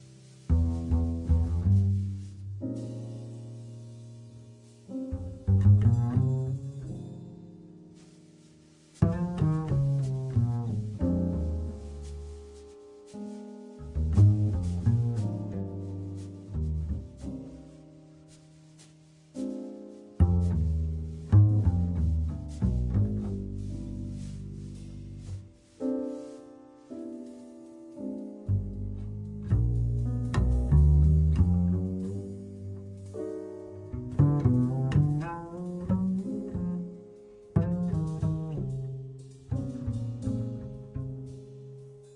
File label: Music Box